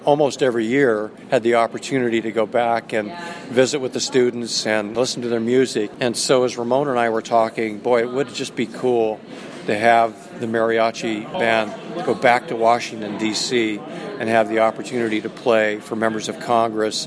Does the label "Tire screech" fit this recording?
No